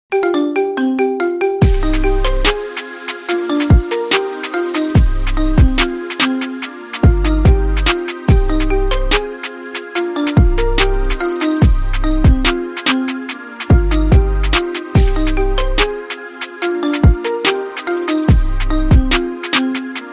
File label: ringtone